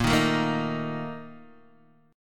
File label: Bb Major 7th Suspended 4th, Guitar, DADGAD